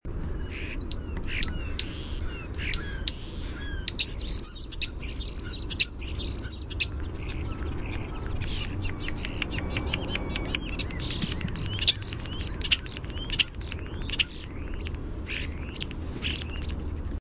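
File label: European Starling whistled song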